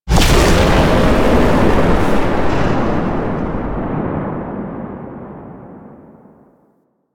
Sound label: Void Dragon Firebreath Cast; Enemies; Abilities; Warframe; Duviri